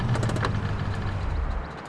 stop, vehicles; emperor

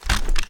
drop; gmod

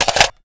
ar reload fast magout, weapons; viper

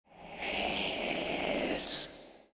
eyelander idle; gmod; durgz; meth

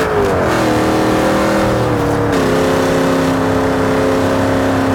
fourth cruise, vehicles; 15charger

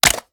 reload fast magout, weapons; gmod; viper

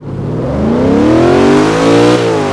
first, vehicles; gmod; tdmcars; morgan aero

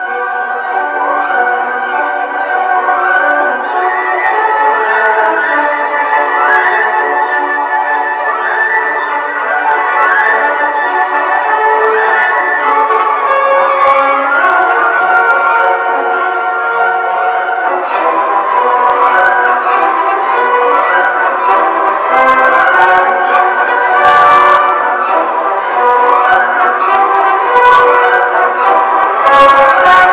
sirens; gmod; tdmsirens; rozzers

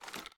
weap sm ads down, reloads; gmod

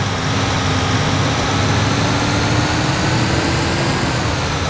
fourth, vehicles; phantom

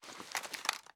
ar akilo47 reload empty fast twist; weapons; viper

